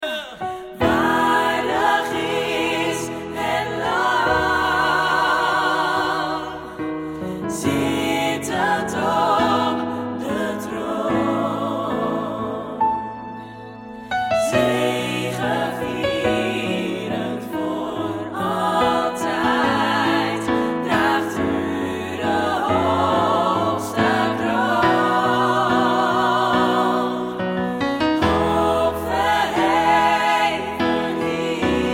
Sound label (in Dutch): Alles, choir; Presence op het Malieveld